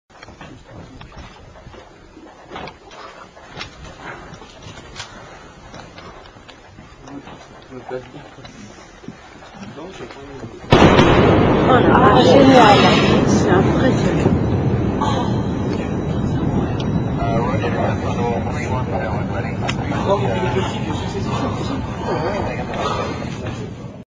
Concordes Thunderous Sonic Boom Sound When It Flies Faster Than The Speed of Sound